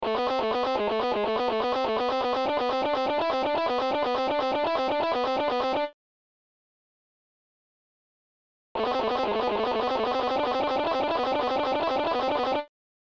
Exercise on G string

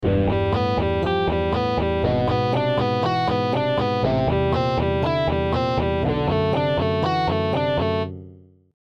Arpиge Bass; Scales and Arpeggios

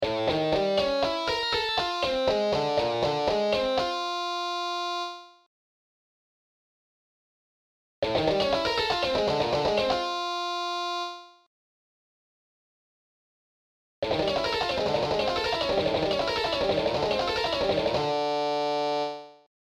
Sweep exercise

